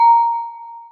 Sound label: celesta, programmable speaker